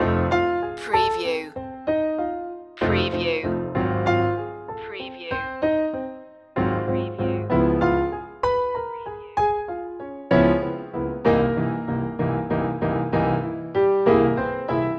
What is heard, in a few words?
bluesy town loop